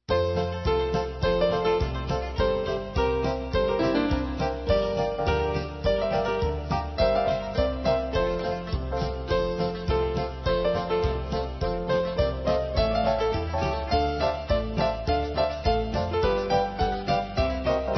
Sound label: Shuffles